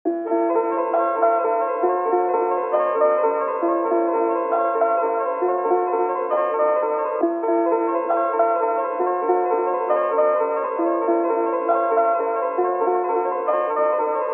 Delta 134 BPM A Min; Cymatics

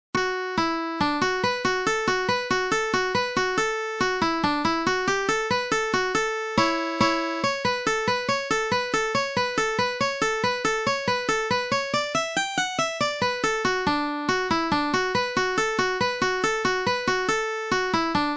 ragtime annie mandolin

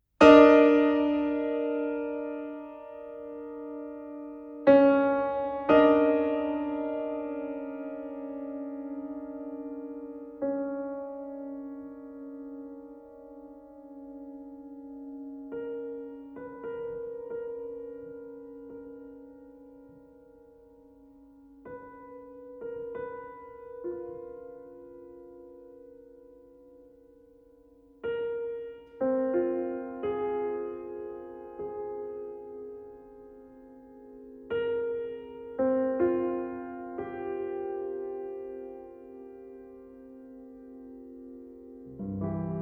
Barcarolle

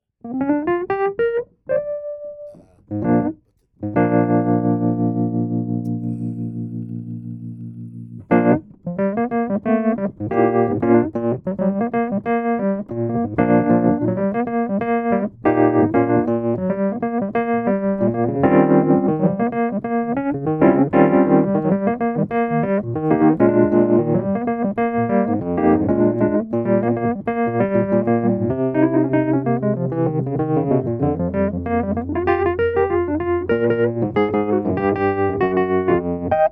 wurly

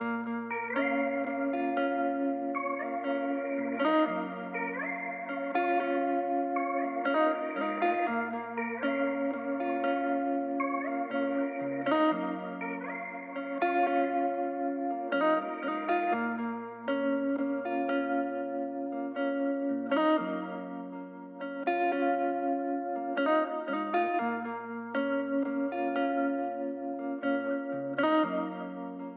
119bpm

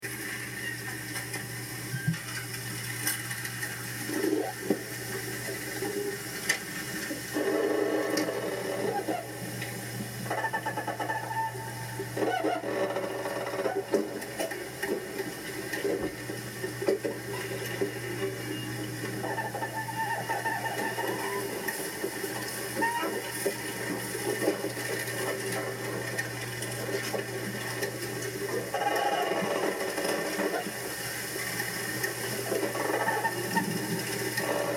bamboo inside

